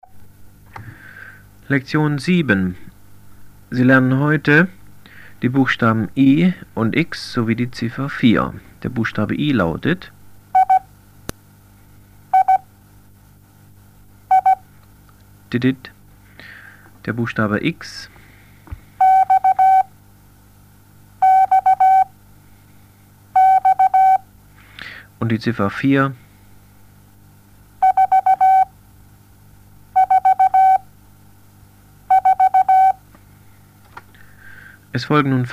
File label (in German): Morsen; Onlinelehrgang